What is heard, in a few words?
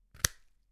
Wooden Branch Snip